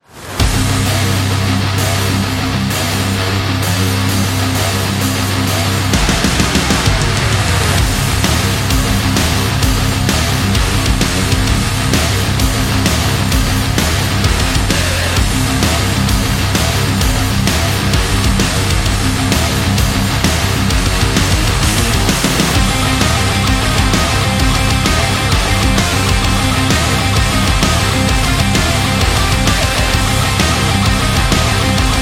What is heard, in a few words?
Metallic Energy Guitar; action metal and rock music